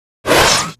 Burst crack; Pc Skill; FlyForInsanity

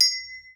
Item Drop Ding; FlyForInsanity

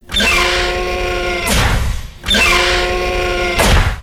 Crane Machinery idle; FlyForInsanity

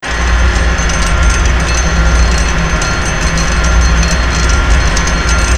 Crane; FlyForInsanity

